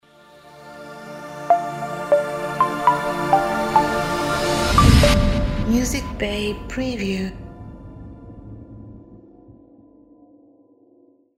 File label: Pluck Logo